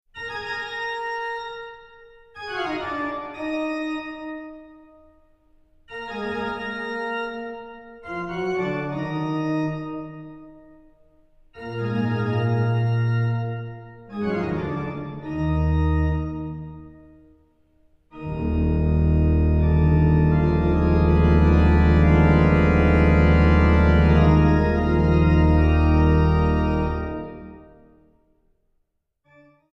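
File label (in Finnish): organ d molli toccata, urkuteosta; j s bach organ toccatas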